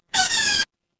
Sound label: cartoon squeak